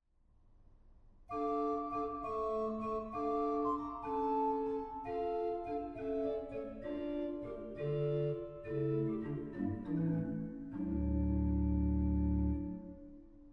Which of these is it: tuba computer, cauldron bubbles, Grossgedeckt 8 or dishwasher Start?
Grossgedeckt 8